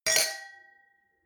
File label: wine glass different clink bounce dull hard, gastronomie; berufe; soundpool